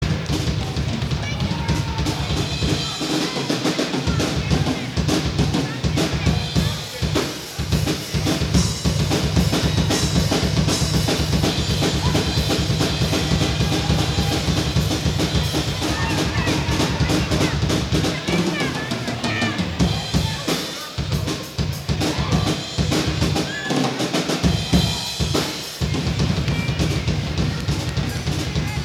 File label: Drums